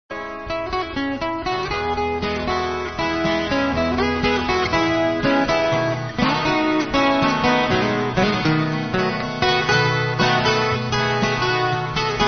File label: boardwalk solo, guitars